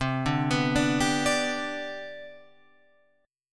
C Major 7th Suspended 2nd C down Guitar Open F, chord; CM7sus2 arpeggiated; JGuitar